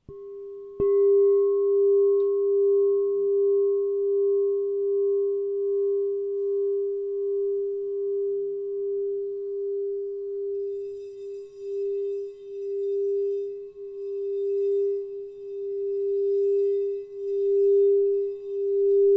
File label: Crystal Tones Singing Bowls